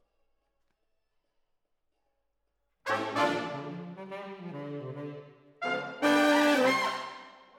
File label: Horns Over